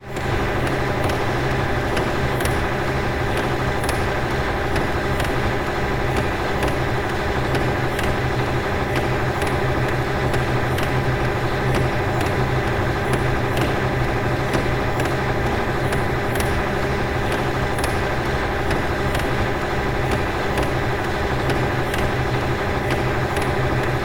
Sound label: industrial factory ambience drone click clunk of machinery